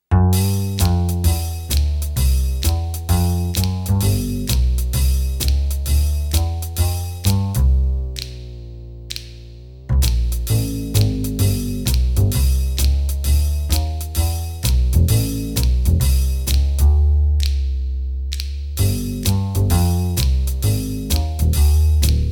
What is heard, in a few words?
Comedy Style; Sound Ideas - Comedy Styles